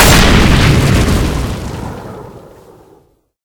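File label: incendiary grenade detonate; arccw go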